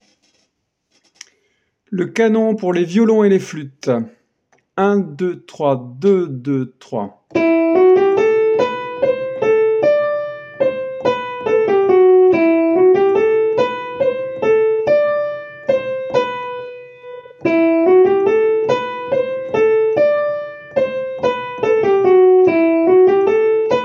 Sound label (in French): canon, Valse